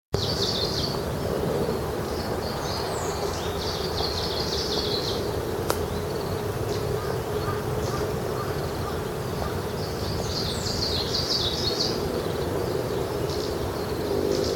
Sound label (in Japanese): oomushikui